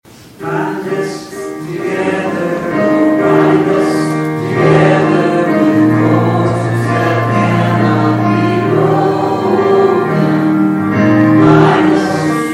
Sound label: Closing Chorus; Benediction; There were 13 here for the service today; Bethel UMC